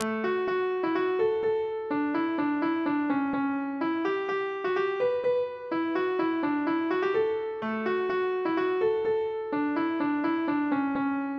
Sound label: polka; boeuf trad